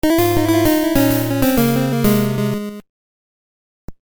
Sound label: Bonus Lose; DKL3